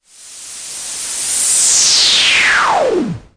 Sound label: noise MiniBrute, Noise Sweeps